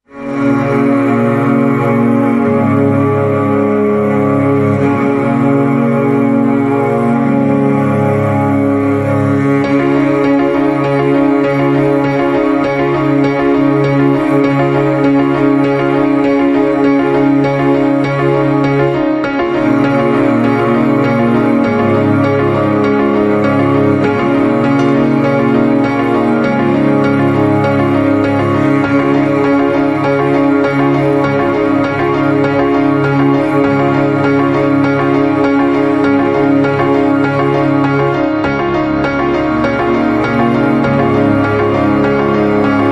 Ambient

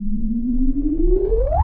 Short Tone Transition

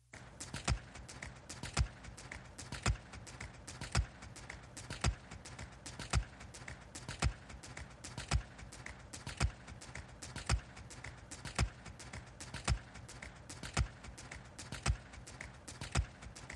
Drums and Bass

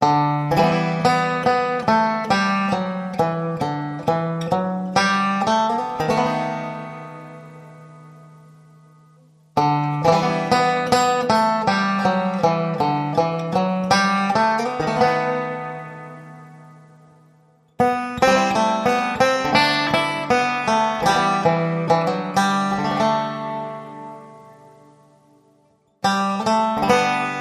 Banjo, No Percussion

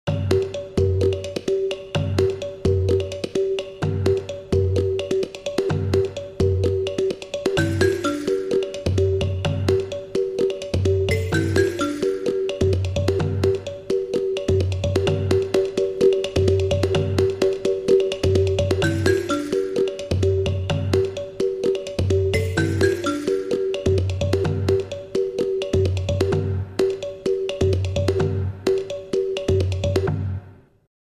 Kalimba Rythme

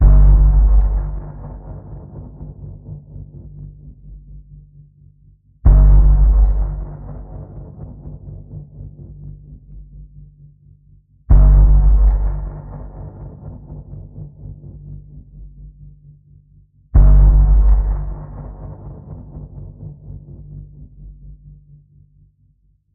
Danger Pulse G, Key of G

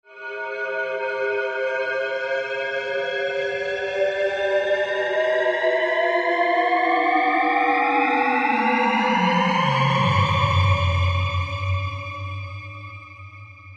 Hushed Slow To Build Rise

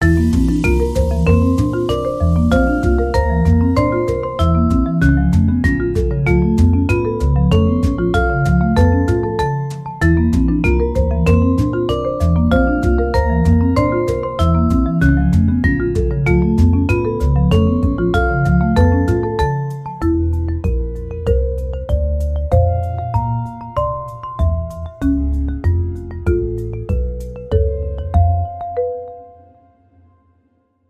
Hypnotic Marimba

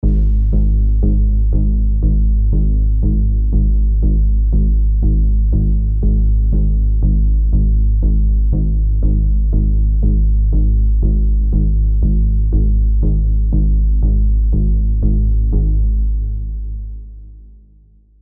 Low Doom Pulse

